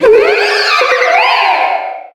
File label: pokemon cry